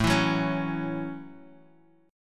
Gb Minor 7th

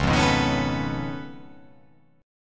C sharp Augmented 9th C sharp down, chord

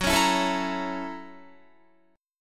Gb 7th Sharp 9th Gb Guitar; strummed; Gb7#9; 14,16,14,14,16,17; JGuitar

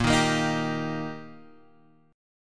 D sharp Major, A sharp

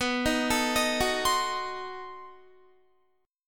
B 7th Flat 9th B Guitar; B7b9; arpeggiated

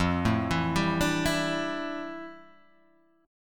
F Major 13th Guitar Drop D

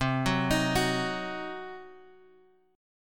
C Diminished C; Cdim Chord {X 3 4 X 4 2} arpeggiated

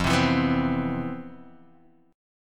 C Major 7th, F, guitar chord; Cmaj7/F strummed; JGuitar

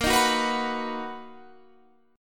B Major A sharp; B/A#; strummed

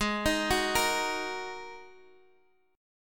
G sharp 7th Suspended 2nd, Guitar, Half Step Down

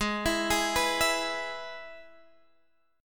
Minor Sharp 5th, Guitar, Drop D; Gsharp; x,11,14,13,12,12

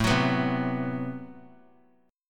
A Minor 6th Add 9th A, Chord; Am6add9 strummed; 21,25,26,22; JGuitar

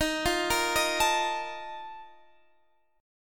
Bb Major 7th Suspended 4th, Eb, chord

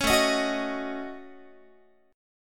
A 6th Flat 5th A Guitar, chord; A6b5 strummed

